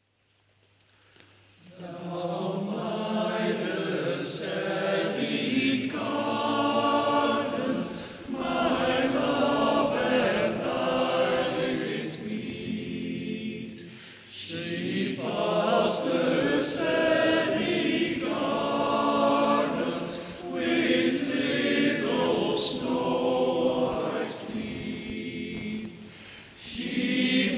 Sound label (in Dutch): Zang en Vriendschap Koor; Down bij de Sally Gardens